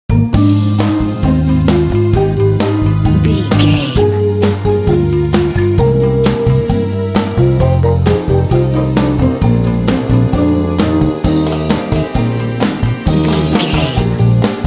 quirky cha cha comedy sting

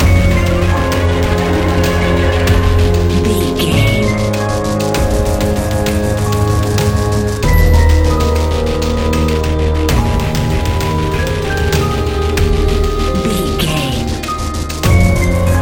modern horror; Video Game

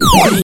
retro laser gun shoot

